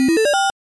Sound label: Insert Coin, alarms; LG Qua tab PZ LGT32